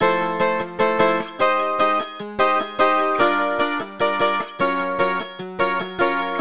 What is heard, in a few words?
Bossa nova, ringtones; Fujitsu F-42A